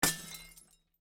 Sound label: Jam Destroying; my cute commissar 2020 gamerip